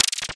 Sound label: multimedia max min interface sound spinning min; sound effects one